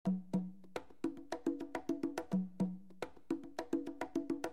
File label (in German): timba, i phone klingeltoene